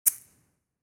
Egg shaker single

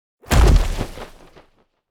Dragon landing ground impact

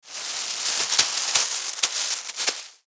Grocery plastic packet rustling

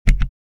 Radio volume control knob press

